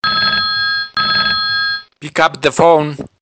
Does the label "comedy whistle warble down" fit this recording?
No